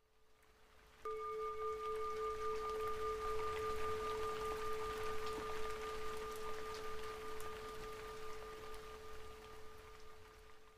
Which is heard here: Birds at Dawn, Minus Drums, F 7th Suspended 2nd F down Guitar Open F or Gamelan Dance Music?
Birds at Dawn